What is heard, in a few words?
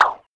Speedy Whoosh